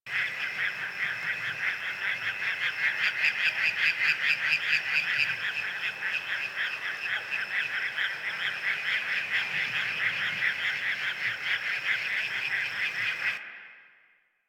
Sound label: Camp Swamp Frog Loop; Warframe; Quests; TheNewWar; Ambience; Drifter Camp